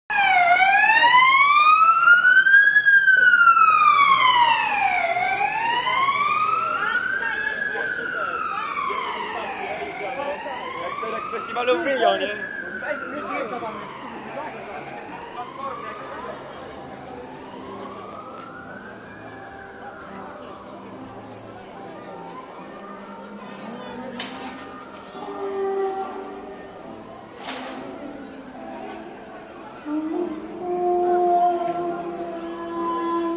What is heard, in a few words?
Ambulance